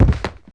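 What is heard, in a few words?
knock down, impacts; Wired for Sound Pro CD; Aristosoft, Inc.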